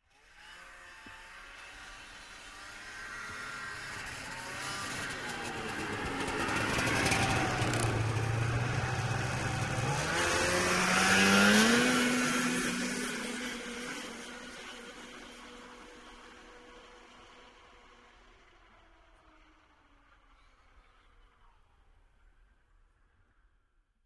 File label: Snowmobile passing by